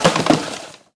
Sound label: kartuz, guns tanks